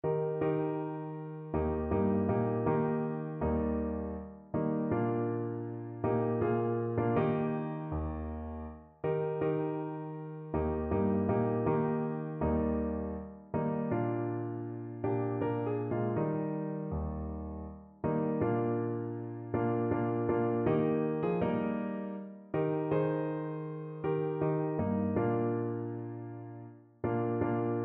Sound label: am brunnen PNO, piano